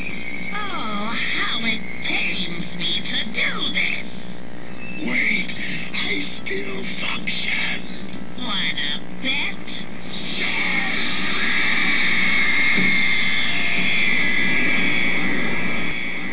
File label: SCREAM